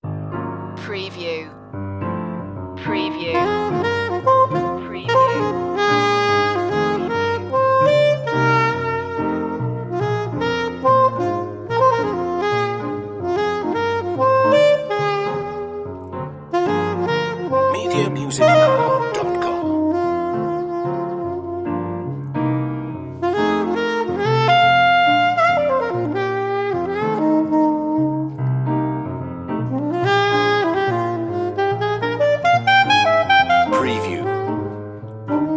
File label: piano sop blues